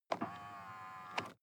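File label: sports car interior side mirror adjust short; verkehr transport; autos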